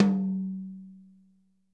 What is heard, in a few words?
HITOM, drum